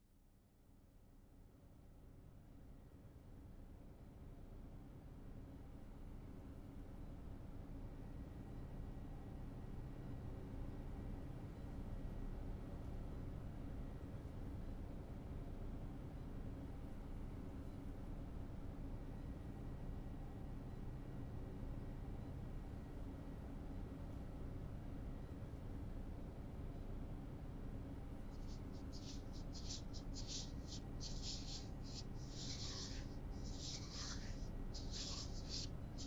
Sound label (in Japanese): ASMR スクラブフットマッサージ（ハニーシュガースクラブでのマッサージ、足つぼ押し)